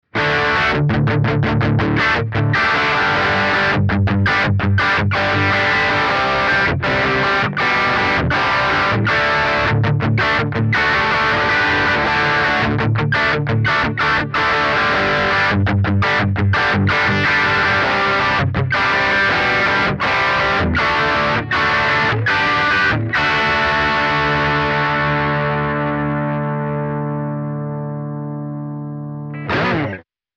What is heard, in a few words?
vox valvenergy mystic edge